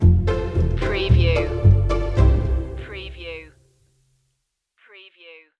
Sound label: laugh and play stinger